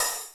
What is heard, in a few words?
LVHAT MD, MPC-60 DRUM2; Greytsounds VOL-1